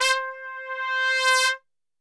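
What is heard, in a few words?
C 3 trumpet; Best Service; Brass Super Section; TRP SWL; 90 s Sample CDs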